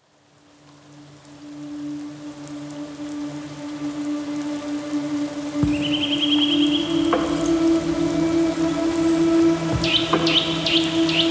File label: Rain Sound Therapy